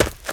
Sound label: Player Run Dirt